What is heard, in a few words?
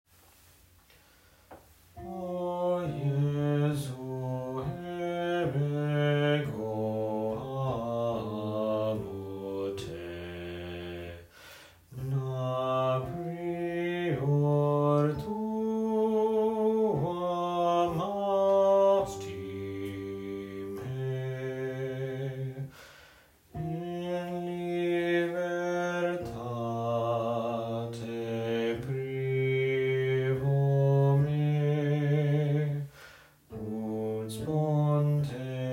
bass, o jesu